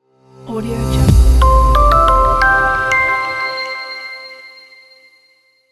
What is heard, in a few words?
brand digital logo